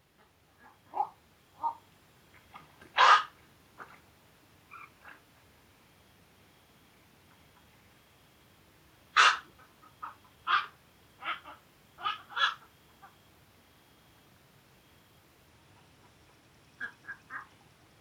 Black-crowned Night-heron, Nycticorax nycticorax; wading largewater

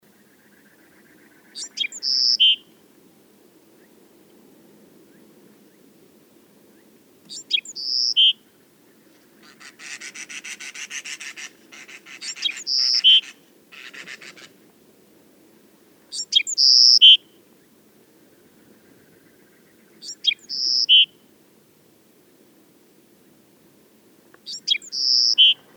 Loggerhead Shrike, Lanius ludovicianus

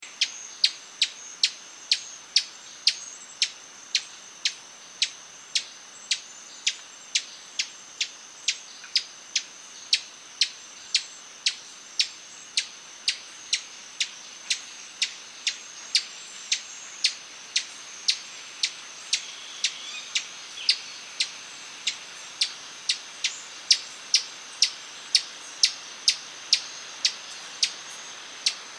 Kentucky Warbler Geothlypis formosa; warblers

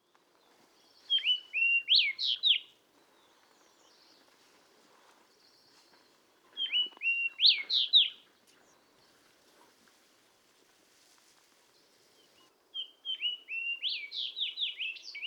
Fox Sparrow Passerella iliaca; sparrows

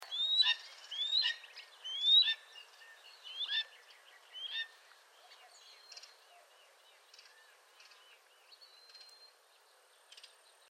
Red-tailed Hawk, Buteo jamaicensis fuertesi; raptors

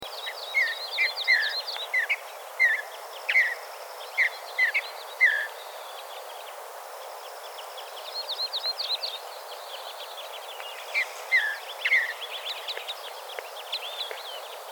Mountain Bluebird Sialia currucoides; thrushes thrashers

